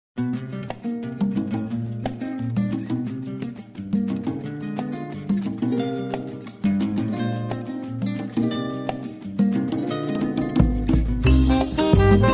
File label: latin music ringtone